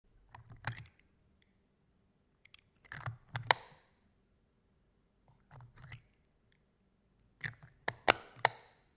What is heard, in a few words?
Lens cap